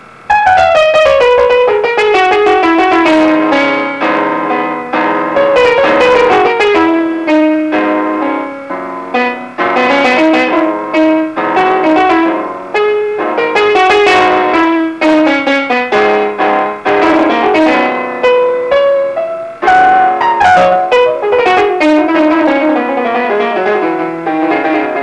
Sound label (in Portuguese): acompanhamento